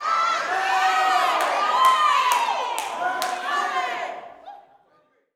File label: YELLS, Vocal Planet Gospel YELL SHOUT; Spectrasonics; Vocal Planet Vol.1 Gospel; akai